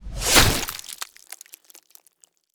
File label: broadsword blood